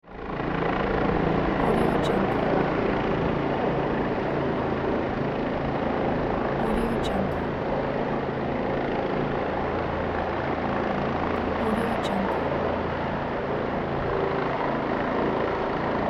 helicopter

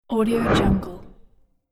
scifi game whoosh; sonic-boom

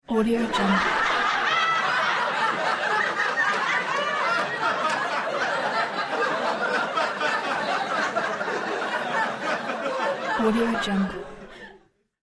heavy laughter from medium studio audience

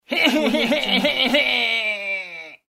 cartoon character annoyed crying

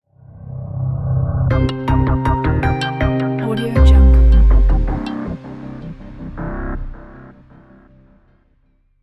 pixel flow logo; pocketrockets

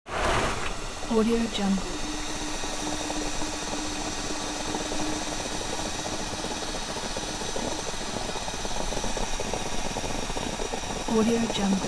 car radiator boil and hiss

